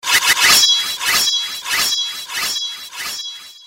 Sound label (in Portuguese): Vinheta espada